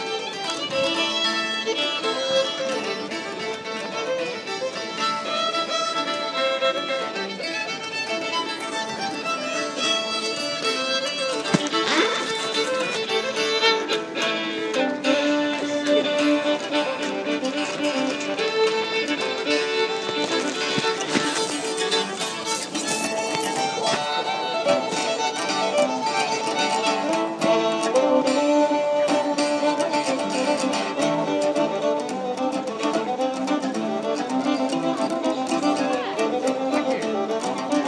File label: playing appalachian folk in lancaster uk